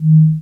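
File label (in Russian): кнопка сухая одиночный шумный звонкий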